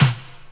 Nice Snare C Key, snare drum tuned to the C note. Loudest frequency: 2080Hz